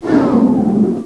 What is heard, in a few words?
Teleporter Close